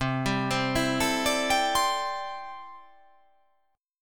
C 6th Add 9th C down

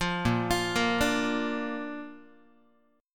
A sharp Major, Guitar; arpeggiated; JGuitar

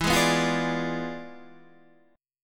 E 7th Flat 9th Guitar Standard, chord

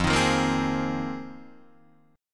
F Minor 11th, Guitar Open D

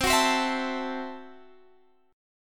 C 6th Flat 5th, C, down, Guitar, Half Step Down

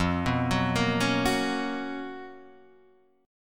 C sharp 13th F

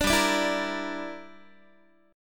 G Minor 6th, Guitar; Gm6 strummed; 17,15,17,14,14,x

